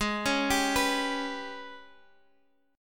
Ab Minor Major 7th Double Flat 5th Ab down Guitar Standard, chordshape; arpeggiated; JGuitar